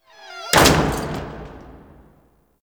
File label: Door Slam; Warframe; Nineteeen Ninety Nine; Romance